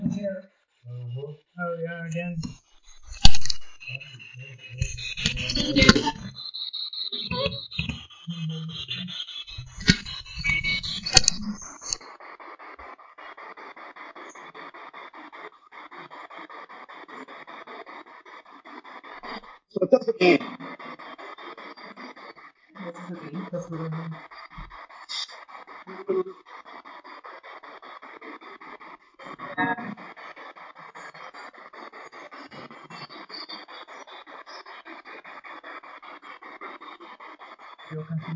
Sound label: Walking to Vestibule Spirit Box